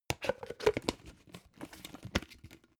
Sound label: plastic bowl close long